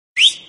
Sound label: small whistle